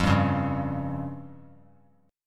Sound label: F Suspended 2nd Sharp 5th F down; Fsus2#5 strummed; JGuitar